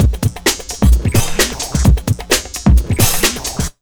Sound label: JUMP 130, Big Beat Drumloops